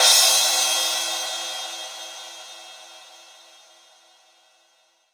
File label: Crash; Sonic Foundry (Sony Creative Software); Knutrix Drum & Bass